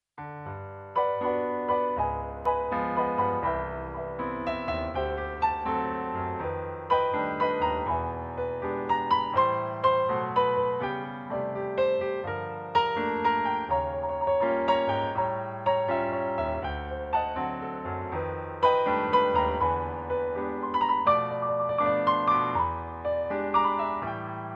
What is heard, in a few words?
PIANO